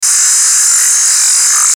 static buzz; Static Buzz Distortion; Production Element Imaging Element Accent Transition; Blastwave

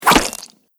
Sword Slash Into Flesh; Sword Sound Effects; combat

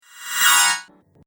Casting Spell, Magic Sound Effects

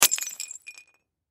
Clay Pigeon Break, Clay Pigeon Break Shatter; Miscellaneous Sports

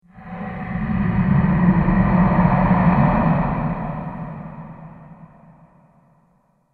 Breathy Horror Transition With Panning; Scary Soundscapes; CraftedSignals

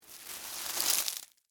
Scrape Ice Cream Cone, Debris; Texture; Scrape Sound Effects; BlastWave

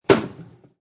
Fridge Shelf Bang, Banging a refrigerator shelf; Kitchen; Household

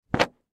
Rug Drop, Rug Drop On Ground; Drops; Sound Effects:Objects

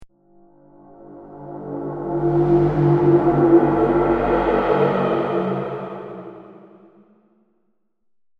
Deep Breathy Horror Transition; Scary Soundscapes; CraftedSignals

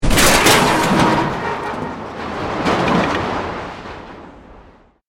Explosion Stereo Metal Collapse; Created explosion effects, house, building or vehicle effects; Bombs

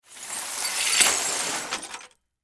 Broom Sweep Up, Broom Sweep Up Junk Pile; Cleaning; Foley

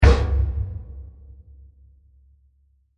Short Metal Bowl Impact; Blasts; Impacts; CraftedSignals

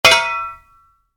Spade hit, Shovel, Impact; Yard Tools

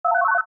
Computer Bleep: Indicating Activity; Beeps; Multimedia; Stuart Duffield

